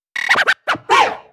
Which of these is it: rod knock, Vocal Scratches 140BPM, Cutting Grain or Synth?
Vocal Scratches 140BPM